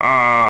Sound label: evil laugh; K. Rool